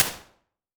Lecture Hall, impulses

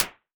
Empty Livingroom, Impulses

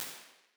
Mid-Sized Room - smooth HF damping

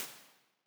Open Air Stadium - short, dense impulse response

